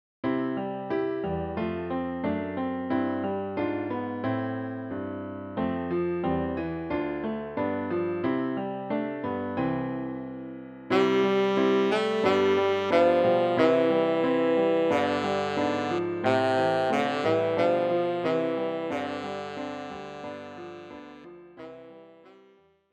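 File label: tenor saxophone, für Tenorsaxophon und Klavier; Schwierigkeitsgrad Klavier Level 8, schwer, mit Vorspiel; Alle Jahre wieder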